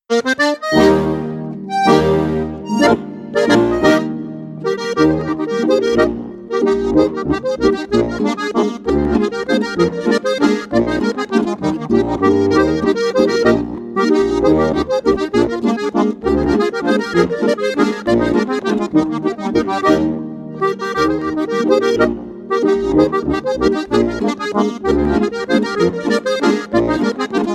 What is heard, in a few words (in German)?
Thaurer Alm Landler